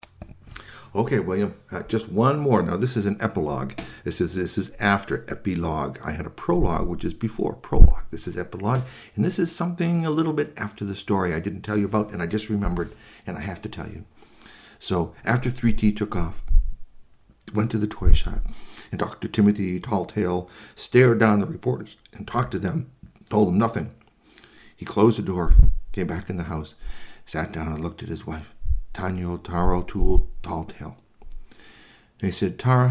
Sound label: squeaky epilogue; Epilogue: News from Mr. Ningyoshi San; Ayatsuri Ningyo Robot - Squeaky Toy